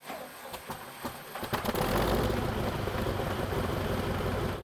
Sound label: start engine, engines; World of Tanks